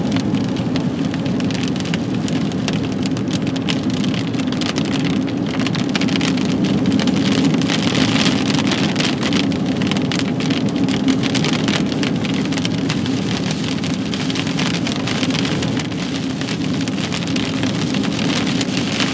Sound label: heavy rain on cars front glass while driving back from tartu